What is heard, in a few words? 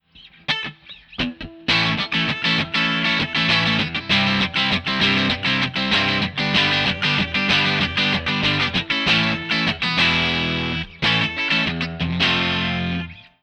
Cruch